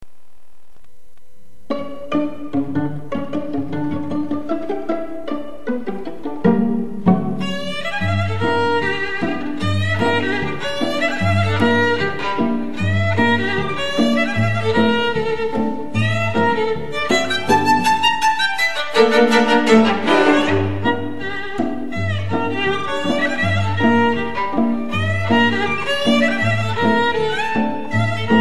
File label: Pizzicato